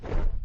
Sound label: wing flap; Adventures in Lestoria